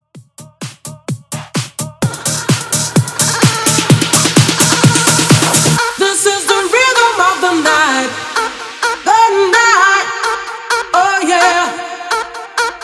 90s Dance Bootleg 128 BPM

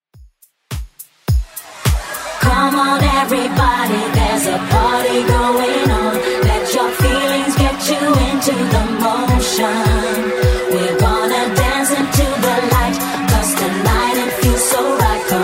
90s Redrum 105bpm

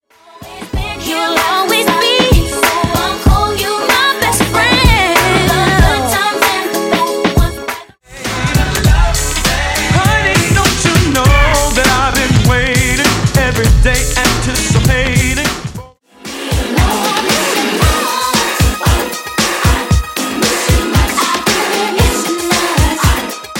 R B Old School 90s 80s 2000s

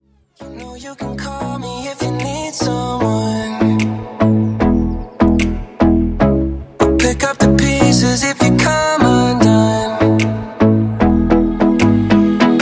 Hip Hop Dubstep; Remixfreakz

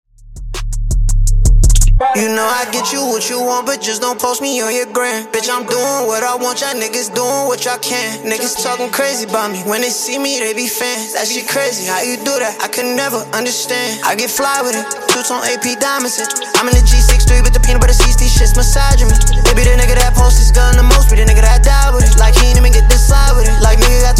Intro Outro Clean Dirty 83 Bpm

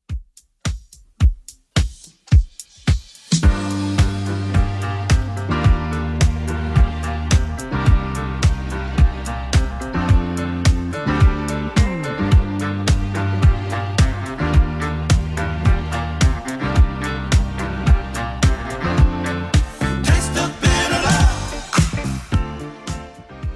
80s Redrum, 108 bpm

